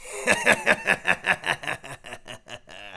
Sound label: laugh